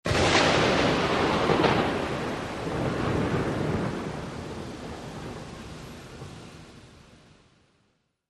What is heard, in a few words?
City Thunder Sharp